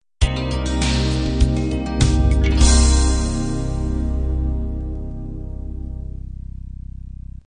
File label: groove ballad end